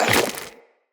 Run Goo, Footsteps; Characters; Tenno

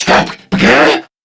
Surprised Crewmen

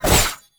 Kunai Whoosh Sweetener; Weapons

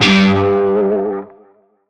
Shawzin Flourish Oct One G; Music; Warframe; Narmer Shawzin

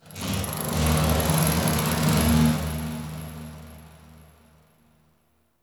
Corpus Ship Metal Movement B Texture Large; Textures

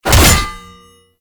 Bayonet Melee Quick Slam; Warframe; Tenno

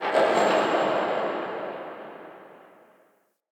Under City Alley Distant Mechanical; Ambience

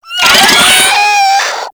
Infested Biped Death; Warframe

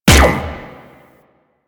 Shot; Warframe; Weapons; Corpus

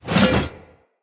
Hammer Whoosh; Weapons; Enemy; Blunt; Hammer And Shield Weapon

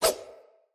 Dagger Whoosh; Weapons; Player; Swords; Josalfar

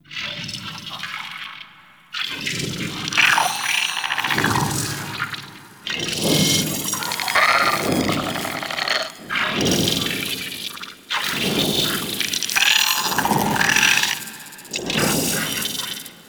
Sentient Movement; Cinematics; Teshin Intro

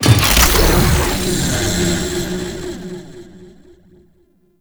Shrine Assemble Activation; Warframe; Duviri Murmur

